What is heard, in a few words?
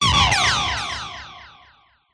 Blasts Synth Delayed Oneshot; sound spark